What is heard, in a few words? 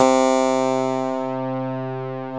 HARPSI BRASS, swing synths; Zero-G - Funky Elements